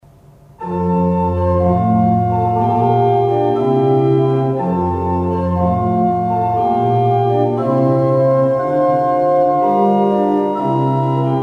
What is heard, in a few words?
Handel air water music